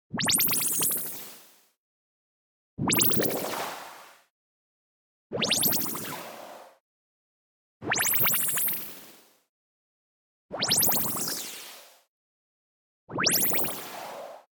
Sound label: LASER Bubbling White Noise; boom library; Anime Essentials